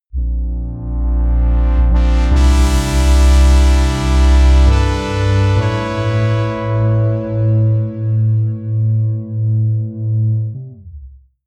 promo pad

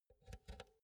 insert cinch slow

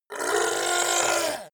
fishman shout pain

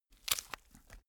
bone fracture

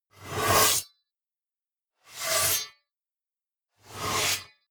scrape

